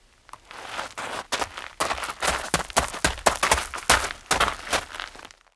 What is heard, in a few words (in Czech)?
beh; Mafie 1